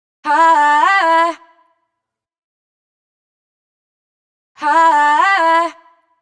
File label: riff 110 C wet, Vocal; Slate Digital Omnivox Vocal Sample Pack